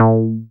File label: Bass SH-101